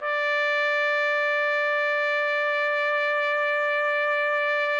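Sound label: TPT, trumpet; Roland - LA Composer vol. 3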